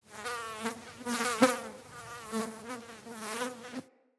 Insect Fly Buzz Close

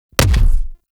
Punch; Warframe; The New War; Weapons; Omicrus

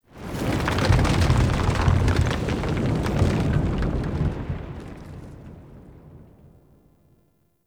Earth Quake Rumble; Warframe; Tau

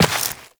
Step Rock, Footsteps, Walk; Warframe; Tenno

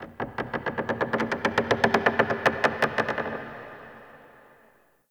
Zariman Wood Creak; Warframe; The New War; Ambience

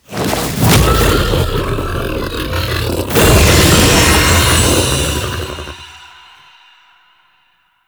Finisher Mini Death; Warframe; The New War; Archon; Amar; Finishers

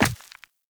Walk Rock, Footsteps; Operator

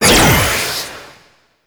Scythe Proj Whizz; Stalker Jade Scythe; Warframe; Weapons

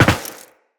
Land Metal, Footsteps; Characters; Tenno

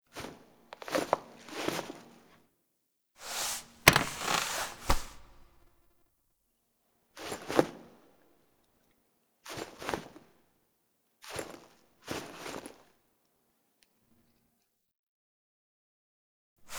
Table For Two Foley; Cinematics; Zariman

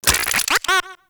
Lure Move; Warframe; Teralyst; Vomvalyst Lure

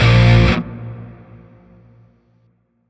Shawzin Min Chord I; Music; Warframe; Grineer; Chords

